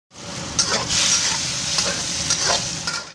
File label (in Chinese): 炒菜